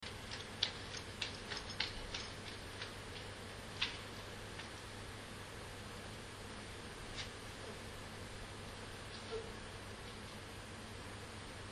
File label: car